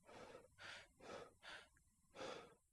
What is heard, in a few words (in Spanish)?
Respiracion cansada